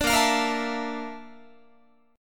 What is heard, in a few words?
Bb Major Sharp 11th Bb down Guitar, chord; strummed